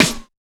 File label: Snare; FLPRO